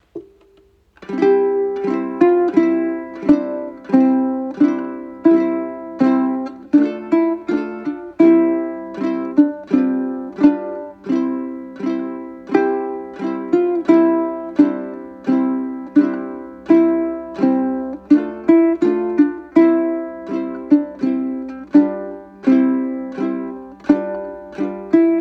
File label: Uke; deck the halls; Uke book